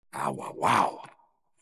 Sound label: owowow; expedition 33; monoco